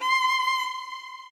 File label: solo